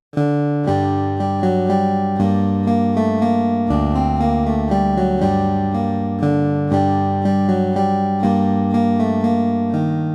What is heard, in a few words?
Minuetto In La Minore Harpsichord; nemus numerical sound restoration